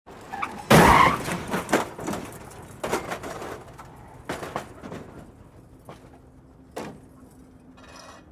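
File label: impact and debris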